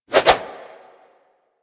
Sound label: Staff Swing Spin E; Warframe